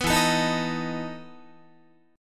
E Major 7th Suspended 4th E down Guitar

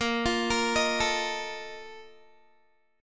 Bb Major 7th Suspended 4th, Guitar; BbM7sus4 arpeggiated

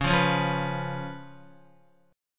C sharp 7th C sharp, Guitar; C#7; strummed; JGuitar

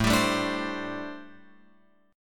A Minor 11th A Guitar Open F; Am11 strummed; x,4,7,4,5,7; JGuitar

